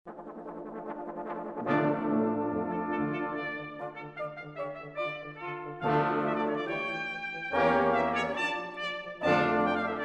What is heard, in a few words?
Blast of Brass